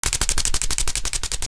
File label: dragon